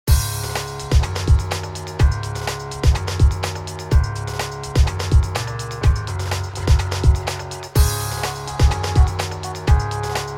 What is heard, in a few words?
kick; pushtec test